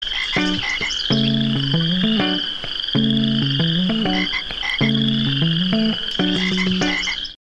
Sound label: Froggy Night; LG AX830 Glimmer